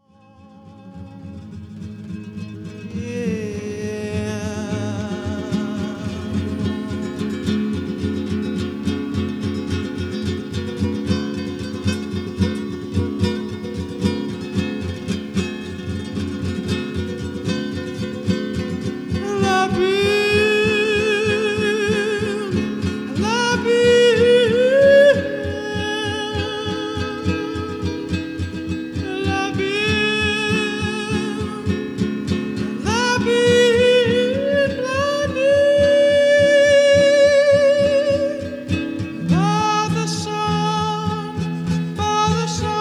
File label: acoustic